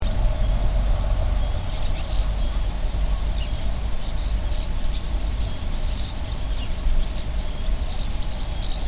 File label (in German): Uferschwalbe